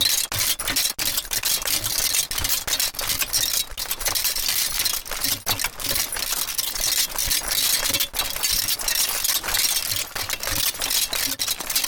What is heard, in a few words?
armor dash, battle